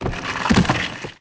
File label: rotate; towergame